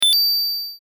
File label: coin